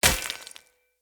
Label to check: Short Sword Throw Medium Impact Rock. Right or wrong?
Right